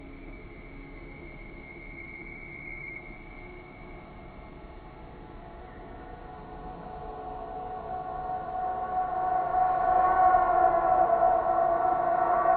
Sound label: High Ambient Horror Music